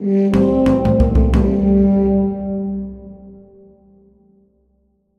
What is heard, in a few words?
Settlement Won Wolf; Armello The Bandit Clan